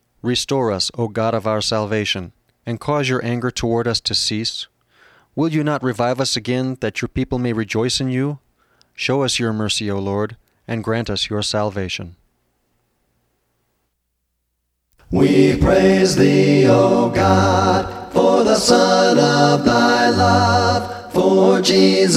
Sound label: music english